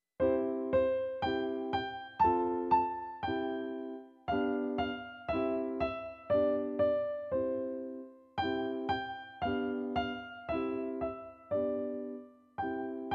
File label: Twinkles chords